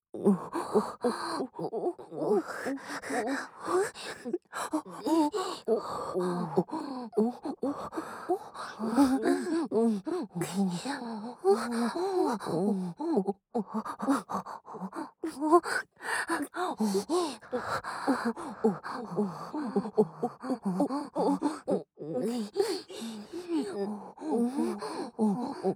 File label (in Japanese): オホ声